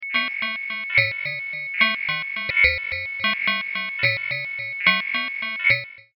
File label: 50s sci fi loop